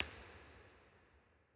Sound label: Early Reflections Close L, Impulse Responses; St. Saviour Jerusalem BA; Ableton Live 12 Lite